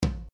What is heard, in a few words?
Floor Tom Sound